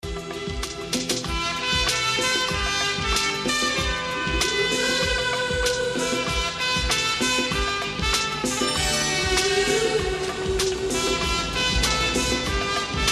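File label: Saxophone; Ddlj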